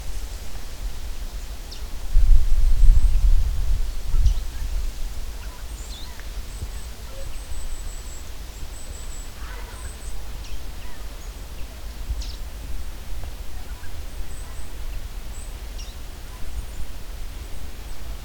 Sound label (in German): goldhaehnchen-laubsaenger